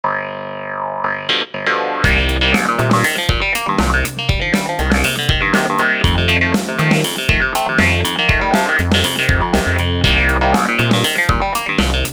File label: ticky clav